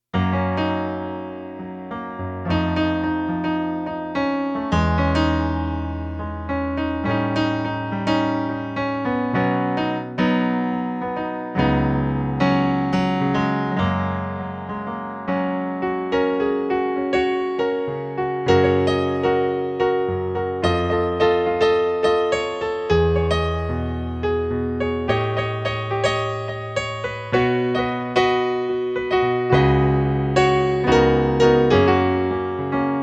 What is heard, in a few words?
Piano